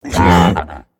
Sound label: pain, procoptodon; zoochosis pack